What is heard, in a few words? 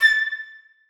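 flutes staccato a5; Sonatina Symphonic Orchestra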